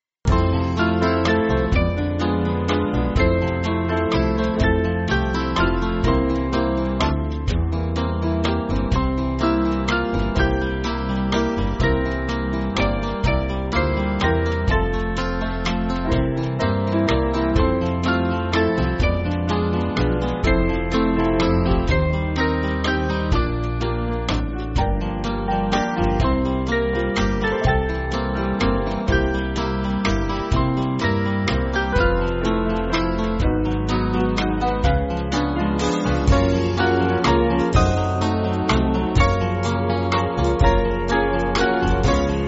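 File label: Band, Backing Files for Churches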